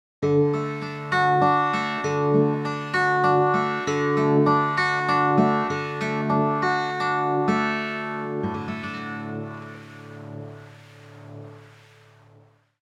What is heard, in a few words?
wahwah